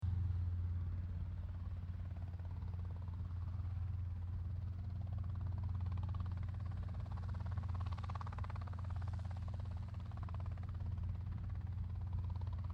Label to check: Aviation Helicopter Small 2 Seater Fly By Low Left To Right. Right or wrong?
Right